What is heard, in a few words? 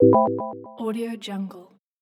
notification